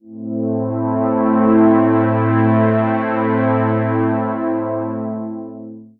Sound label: evolving cinematic pad in am